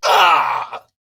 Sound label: Pain; Warframe; Dax Ashigaru; Battle Barks